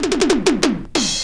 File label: Fills; AMG; Now CD-ROM (Roland)